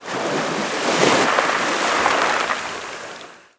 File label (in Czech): Vlny; wob2 demo